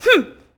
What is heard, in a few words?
Boxing Female Punch Grunt